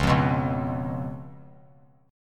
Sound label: Db 7th Db down; Db7 strummed; JGuitar